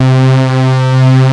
POLY BRIGHT, KEYS; Pro-Rec; Synthsonic

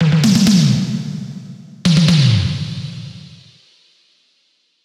130 Beat Fill; Zero-G - Future Wave

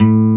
Nylon Guitar A1; Proteus 2000; Digital Sound Factory Proteus 2000

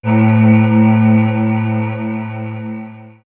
Swirls Drone, pads; X-Static Goldmine

